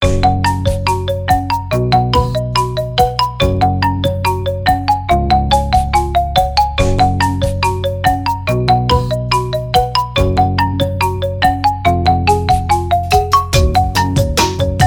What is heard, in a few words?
Continuous Cycle; ZTE Axon 20 5G; ringtones